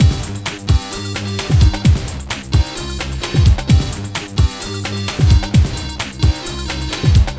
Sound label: la indu; magix